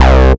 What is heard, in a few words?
Bass C, Offbeat Bass